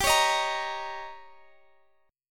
Eb 7th

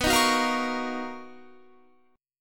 B-Major Sharp 11th B down Guitar; strummed

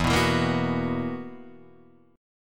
B Major 7th Suspended 4th E

